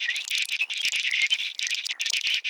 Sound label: Brushflit Sing